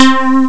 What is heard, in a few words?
nylon vibree; Amiga Soundtracker Sample Packs